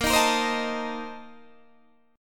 A sharp Major 7th Suspended 2nd Suspended 4th A sharp Guitar Open D